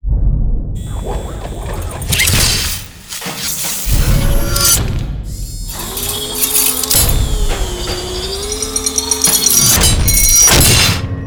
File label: ambience reawakened; portal 2; glados